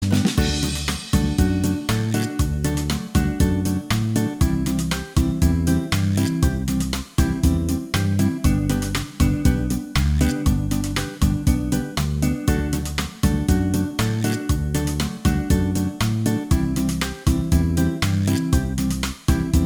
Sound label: beguine